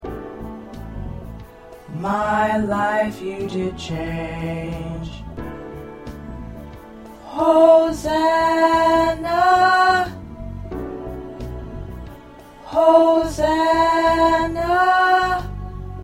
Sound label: Tenor, choir parts